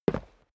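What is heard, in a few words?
step boots single concrete single stair up, footsteps; schritte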